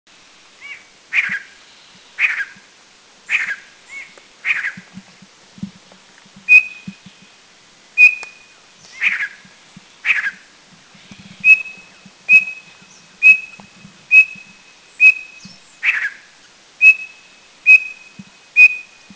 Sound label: Giant Nuthatch, Doi Chiang Dao; Thailand